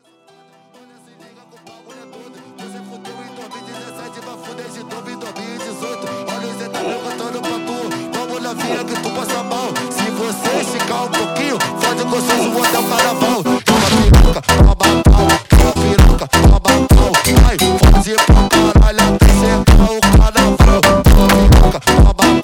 Funk

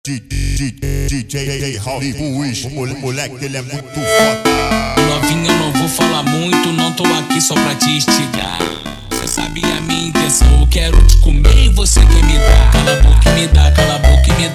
slowed, funk

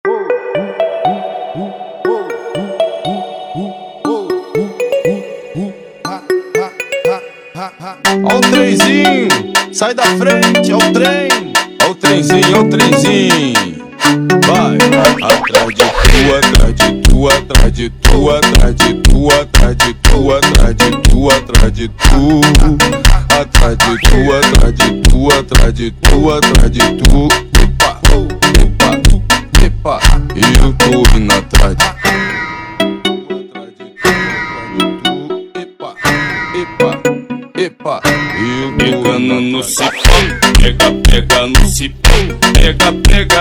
Funk

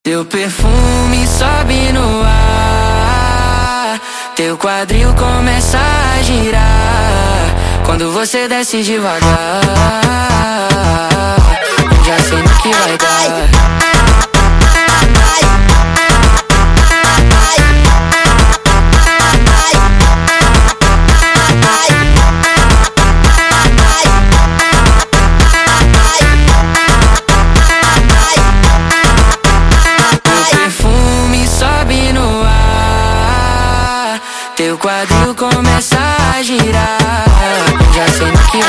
sped Up, funk

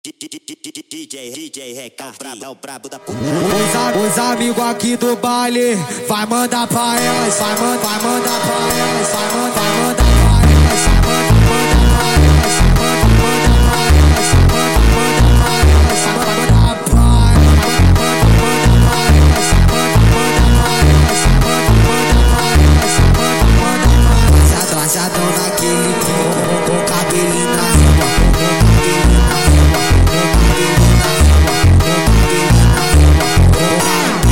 sped Up, funk

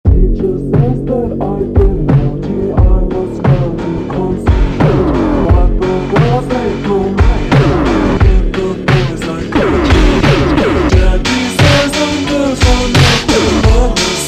tekk ultra Slowed

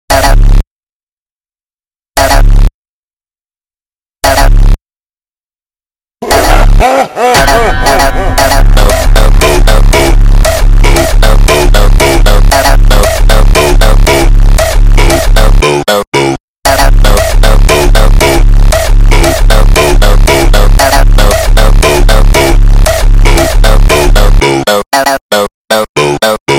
Hardstyle slowed, funk

